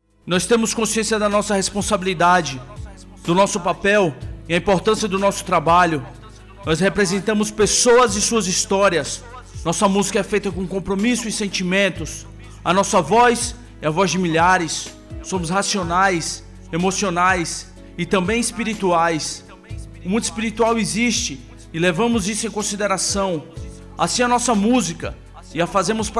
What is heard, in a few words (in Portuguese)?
rap nacional; rap gospel